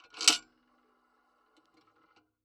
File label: PayPhone